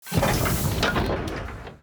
imp cannon start

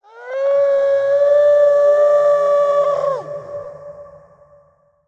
wolf lord roar

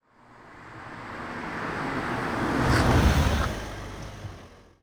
drive stop

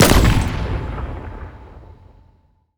a sniper rifle

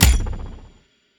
cello

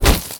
tortoise claw

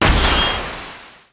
glow sword

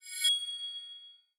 sharp end

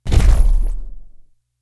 javelin throw cast

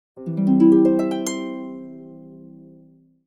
harp normal

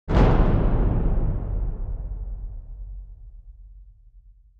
drum

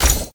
rich fan

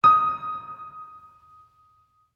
piano heavy

